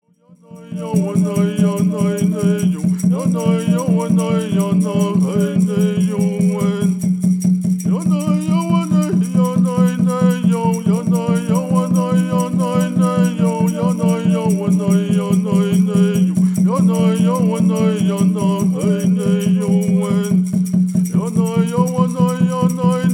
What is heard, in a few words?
Peyote Song